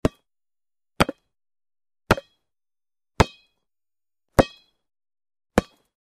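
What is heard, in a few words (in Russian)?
принятие футбольного мяча на грудь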